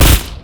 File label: spray and pray; LoL; gangster twitch